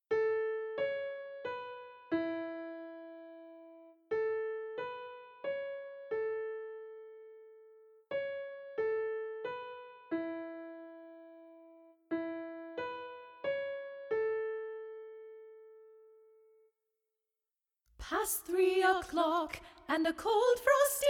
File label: Alto